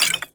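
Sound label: item potion down; Skyrim Special Edition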